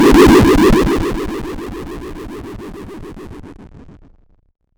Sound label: retro 16 bit video game